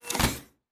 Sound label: Door, Sci-Fi Sounds